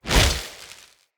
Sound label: Casting Device Cast Left; Weapons; Player